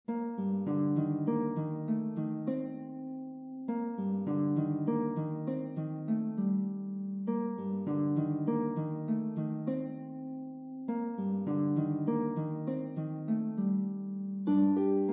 lute; ROMANTIC NOTION

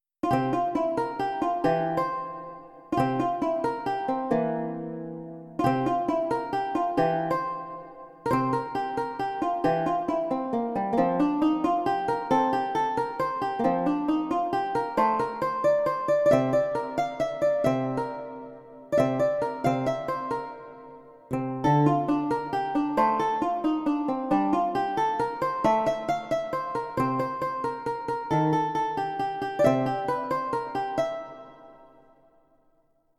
BLUES, renaissance lute; GOOD MOOD

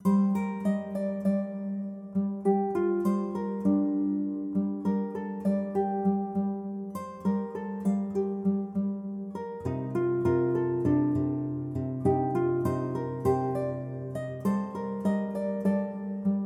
Blues Postlude, blues for solo guitar

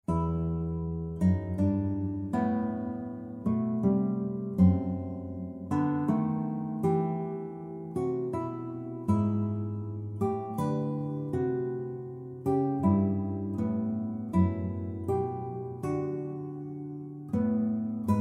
guitar; CONFLUENCE; two part species counterpoint guitar compositions

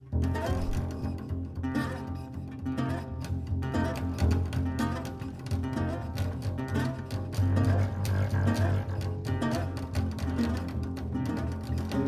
Jig; Gnomish

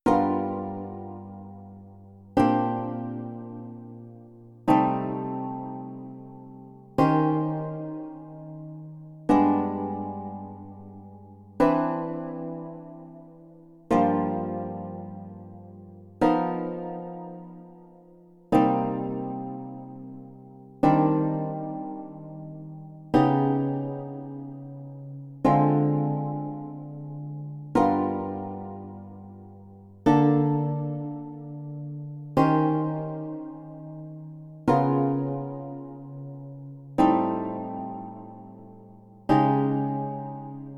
BLUES, renaissance lute; PEACE PIECE

